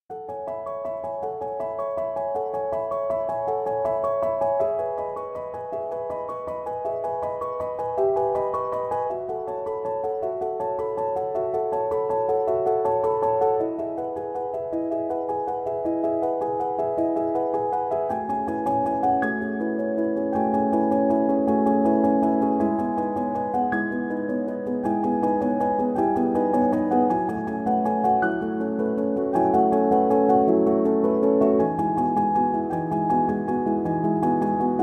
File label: piano instrumental